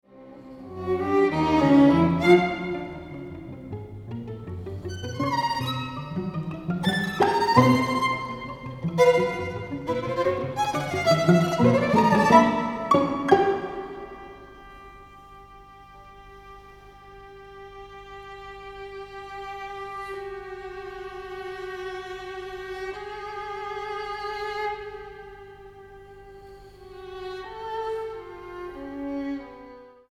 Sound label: String Quartet